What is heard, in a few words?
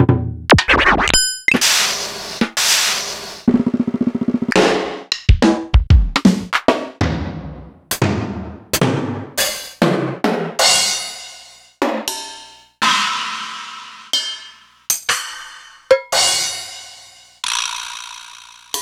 Korg N5 Rock Kit slices C#3